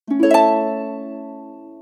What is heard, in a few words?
New Item Perchased